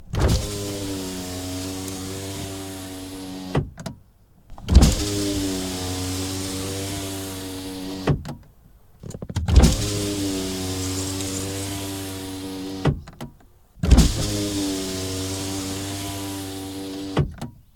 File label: Toyota Corolla Verso 1.6 foley interior window open; mono; Soundholder